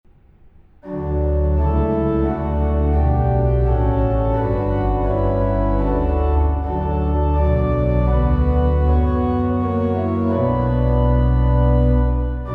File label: organ; hymns